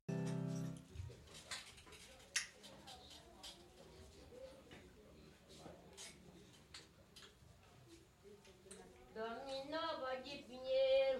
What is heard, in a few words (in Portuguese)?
fanti baiao de princesas; pinheiro